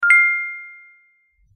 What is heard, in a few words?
bright bell